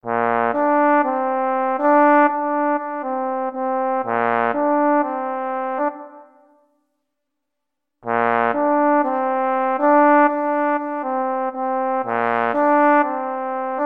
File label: samba, trombone